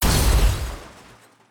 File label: car clash